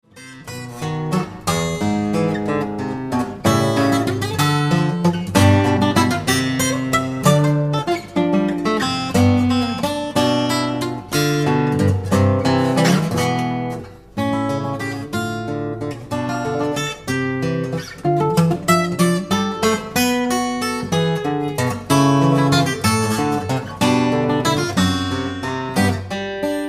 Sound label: Waltz